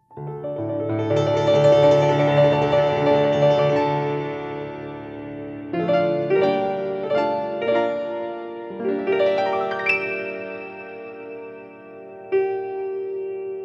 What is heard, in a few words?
Instrumental